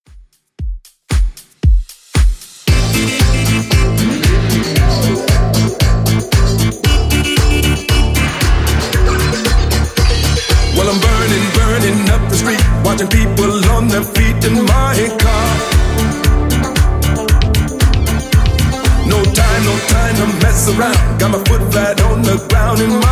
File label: 80s Redrum 115bpm CLEAN